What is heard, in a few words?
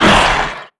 grunt, antlion; creatures; vox